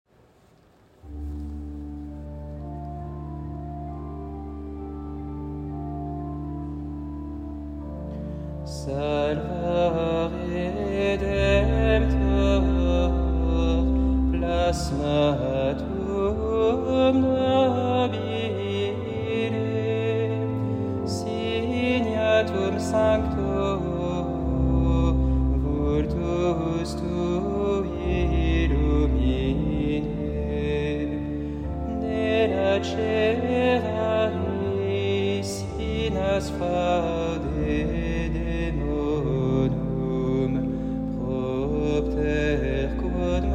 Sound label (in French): tierce latin; vendredi saint careme; semaine sainte